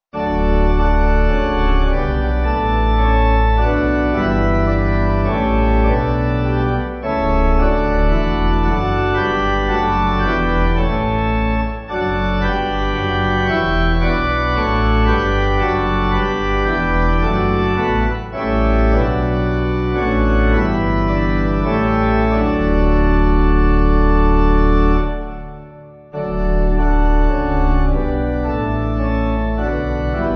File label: Pipe